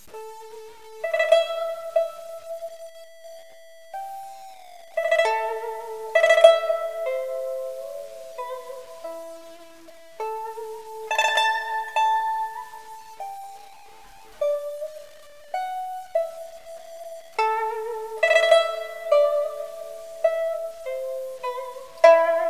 music; antro

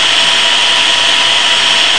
sawblade loop; svencoop

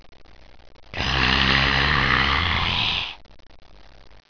pharaoh die; svencoop; sc royals

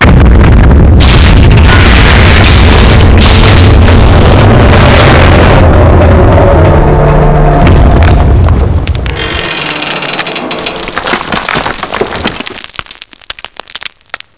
buzzer boom quake; svencoop; trempler

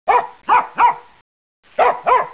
doggy

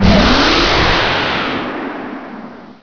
flower hurt; svencoop; toonrun

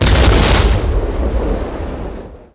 explode; weapons

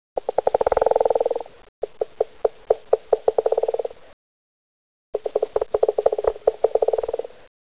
raptor idle normal

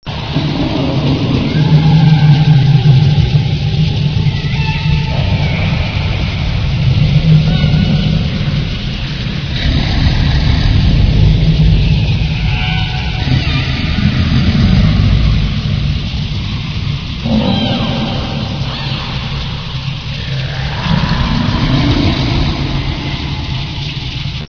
prison hall; svencoop; aompa

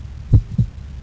heart; ns hulk

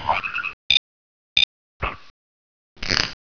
scorp idle; gearbox; lg scorp

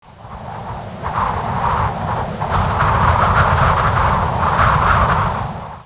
WIND, ambience; dod

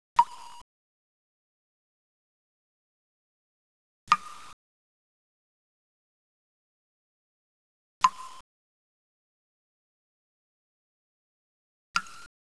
drip

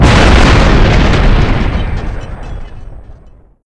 explo metal; svencoop; central bunker

